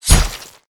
knife stab; weapons; kuilu

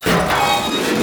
door

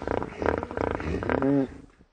squid die

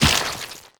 roach splat

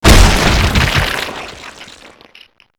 gibbing

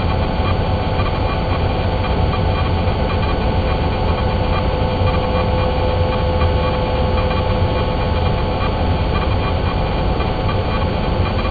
comproom loop, ambient; morass beta

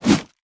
knife swing; weapons; kuilu

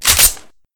p228 slide pull, weapons; kuilu

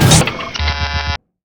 key locked, doors

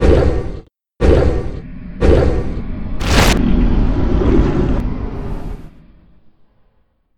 powerup off

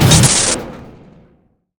locked, doors

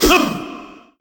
punch

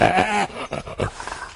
pain; npc; repeater

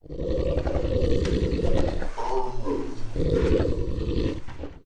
beast idle; morass beta; morassbeast

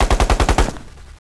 mgun; de loststation cz

